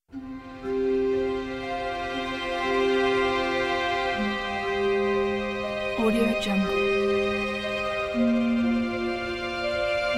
emotional choir; voices of tallinn; slowdescent-to-wild

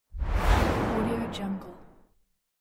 whoosh soft

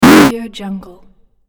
platform hit

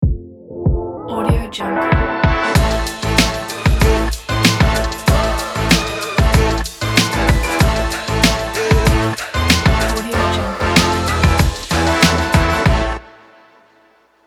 hip hop logo

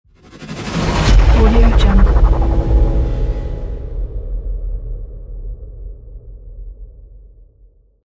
whoosh hit; archimusic

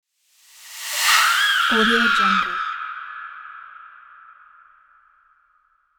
terror scream

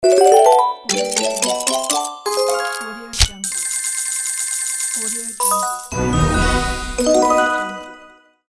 slot machine sound pack

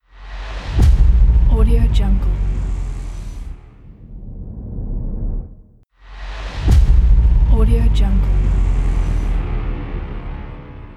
cinematic ambient logo

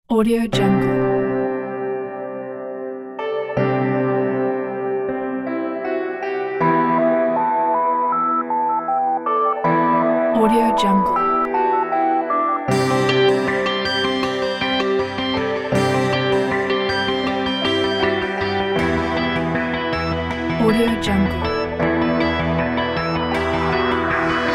hiphop

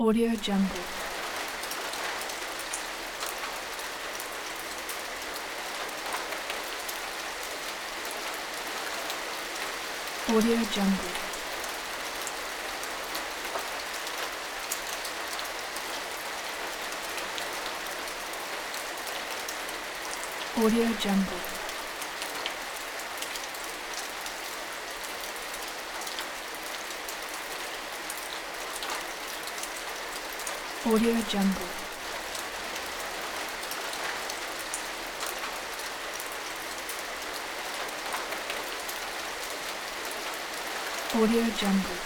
rain street

